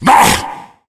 tank pain; source engine; left4dead2

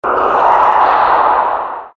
male scream, halloween; Source Engine; tf

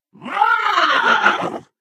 ballbot jump short

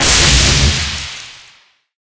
flame out; video games; source engine; tf

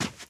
grass, footsteps, run; player; source engine; left 4 dead 2; boomer

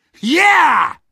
dog cheers; Portal 2; Source Engine; video games; dogfight

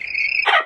antlion grub idle

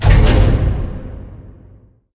piston stop

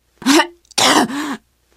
cough, teengirl; Left 4 Dead; Source Engine; survivor

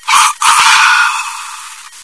pain, bot; Source Engine; cstrike

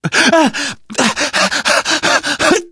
exertion critical, survivor voice, manager; source engine; left 4 dead 2

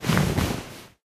taunt luxury lounge chair get up; video games; source engine; tf

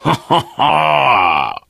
hurt major, biker; voice

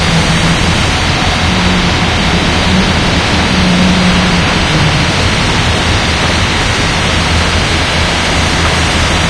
amb heavy mach lp, industrial; video games; ambient

